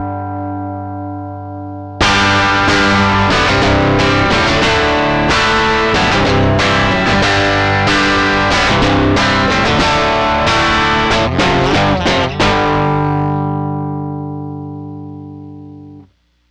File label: tube amp 5U4; higher gain simulated output; Not exactly what the amp would actually sound like but close